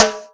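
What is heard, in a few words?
Boss DR-202 percussion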